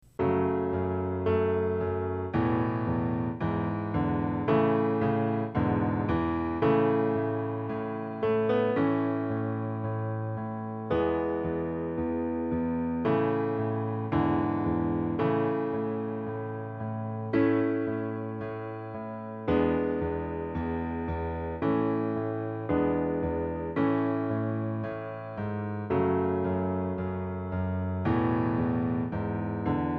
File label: music tenor accompaniment